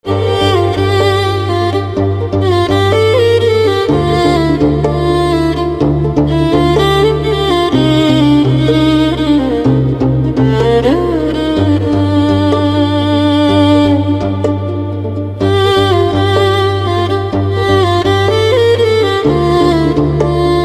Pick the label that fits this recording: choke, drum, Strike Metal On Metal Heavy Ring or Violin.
Violin